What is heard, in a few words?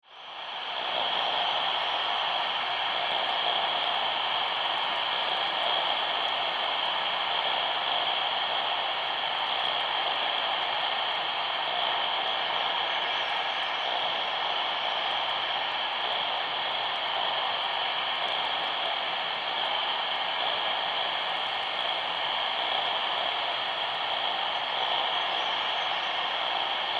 Science Fiction Alien Jungle Ambience Insects; Sci-Fi